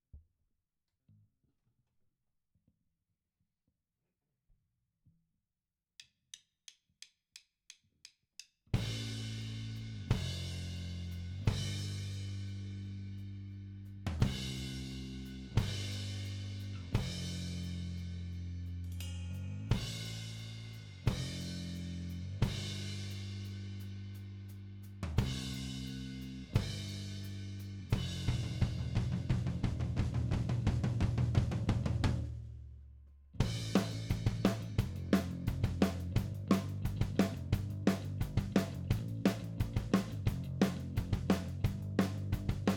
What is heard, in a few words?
Drums and Bass